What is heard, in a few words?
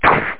SLICE